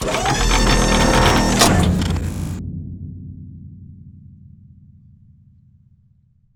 lower; combat; Cosmic Rage; The Experience-light; megasuit

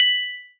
Clan Chat, comms; Cosmic Rage; The Experience-light

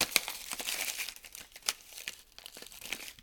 loading, fishing; Cosmic Rage; The Experience-light

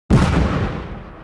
boom; Cosmic Rage; planetoid

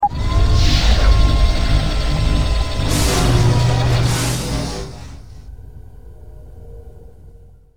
deploy, salvaging; Cosmic Rage; The Experience-light; ships

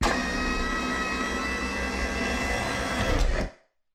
open top, vehicle; Cosmic Rage; The Experience-light

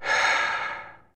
sigh; Cosmic Rage

